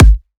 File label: Kick, Drums